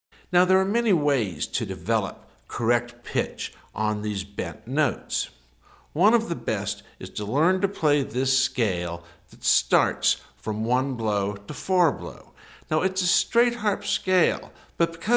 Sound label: Bending Exercise Scale, harmonica